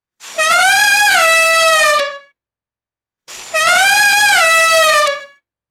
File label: strange buzzy comic ahooga horn bursts